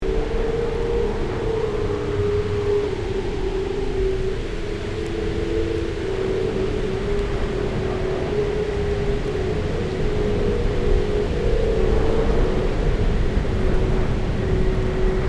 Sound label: Strong winds on alien planet